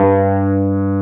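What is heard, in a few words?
Wurlitzer G2 - L; USB Soundscan vol.09; AKAI; Keyboards Old School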